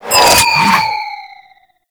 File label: Grenade Proj By; Warframe; Lasrian Soldiers; Weapons; Lasrian Goonadier Grenade